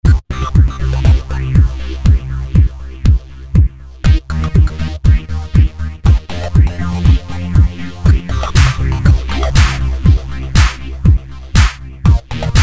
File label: arp